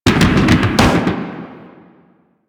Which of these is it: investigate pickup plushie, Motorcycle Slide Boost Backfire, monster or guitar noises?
Motorcycle Slide Boost Backfire